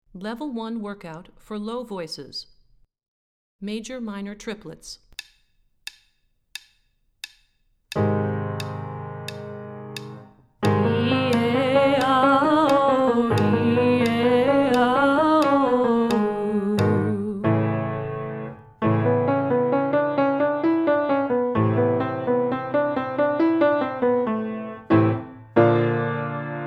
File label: Major Minor Triplets Low, warmups